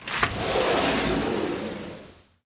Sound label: door open; Original TK Sounds